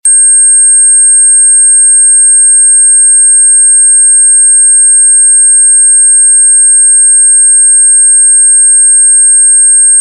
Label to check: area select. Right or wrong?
Wrong